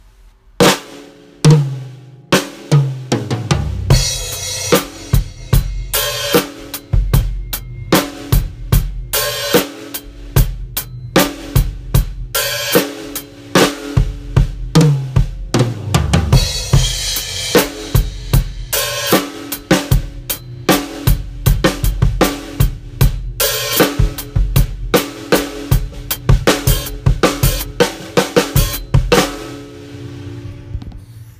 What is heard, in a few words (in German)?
Schlagzeug; Klangproben Instrumente